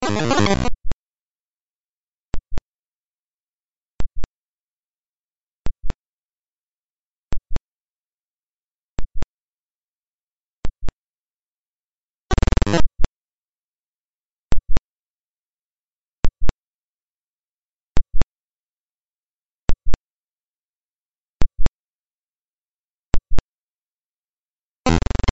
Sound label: Radar Echoes From Titans Surface